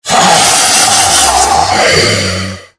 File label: lizard bat